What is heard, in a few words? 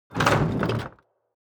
object medieval chest wood medium open; smartsoundfx